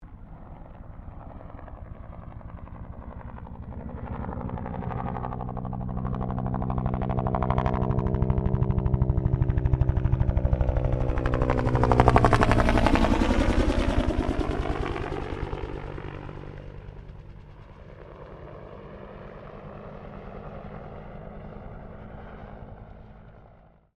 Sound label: HELICOPTER 1967 BELL UH1 IROQUIOS PASS